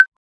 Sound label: menu hit; osu!; Retronix 2.0 HD optimized